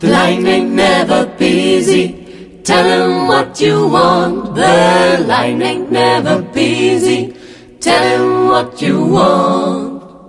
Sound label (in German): Gospel Klingelton